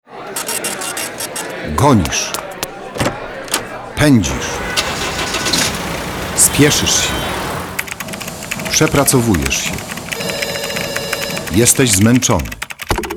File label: jingiel